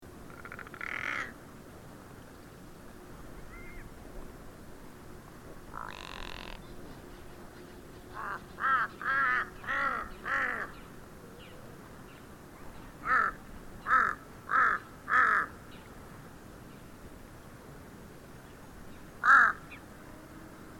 Tibetan crow

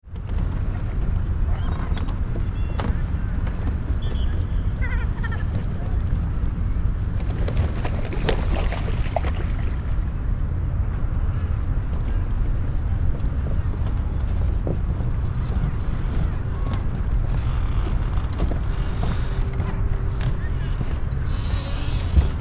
Scaring Gulls

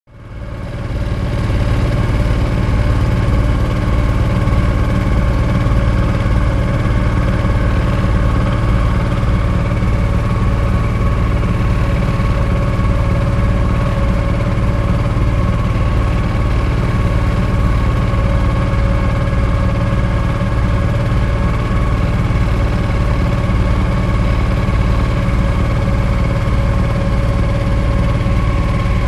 Boat Motor, vietnam

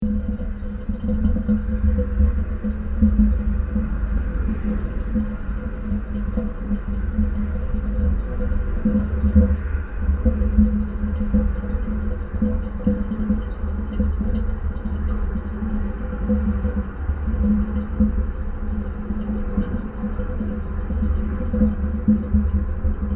takeoff

